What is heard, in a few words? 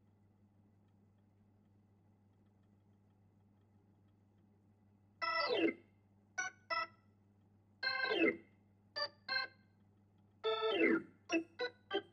Hammond